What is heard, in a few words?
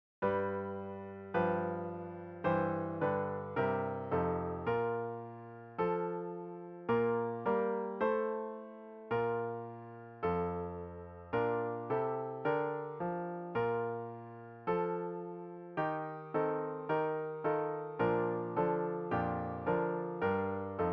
Solo 8. F Horn; F Brass; Development Band Tuition